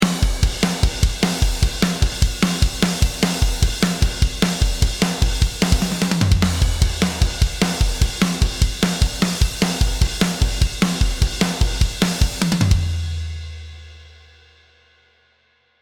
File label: Hard Rock Groove 3 - Jumpstart (Processed), drums